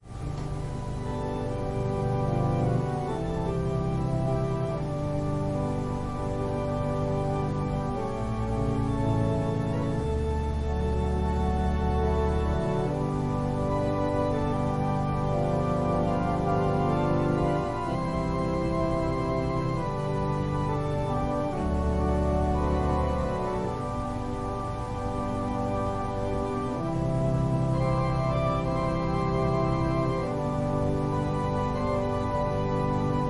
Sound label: Organ prelude